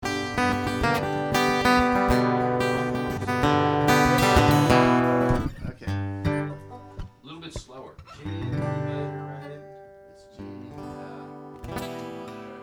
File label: Soundcheck